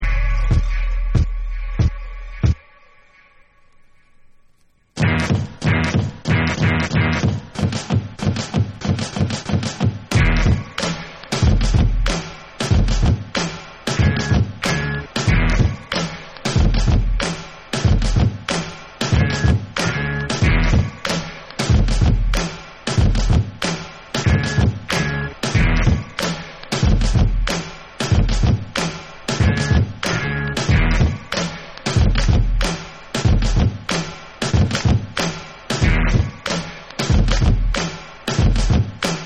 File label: Bonus Beats